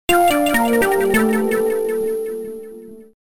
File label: Tank Obtainment Jingle; Metroid Prime Hunters